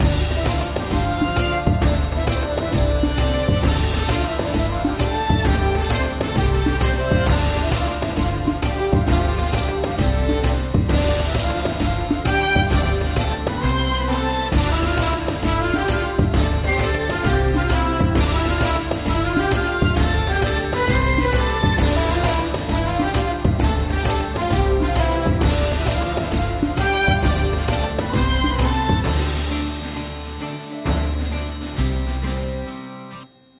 big win; Sheriff Of Nottingham

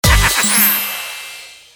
bonus; Red Chilli

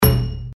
reelspin click; Dragon Boat

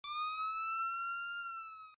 FS END; games; Bank Raid

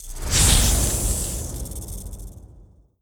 symbol snake; Demon Jack 27; Wazdan

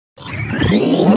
auto fill; games; Double Double Bonus

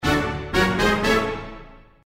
win; Four Beauties

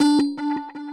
spin scatter; In The Forest; Wazdan

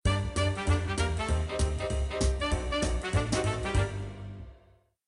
WIN MAX; Bars And Sevens

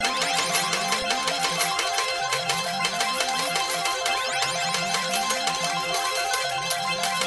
increment; Hot Spin Deluxe

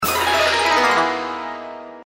shrink; Princess Wencheng

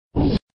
UI INFO; Two Sevens

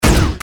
UI shot; Paradise

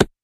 spin stop; In The Forest; Wazdan

